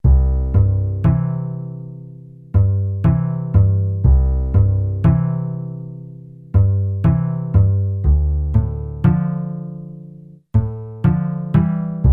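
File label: Acoustic Loop 120bpm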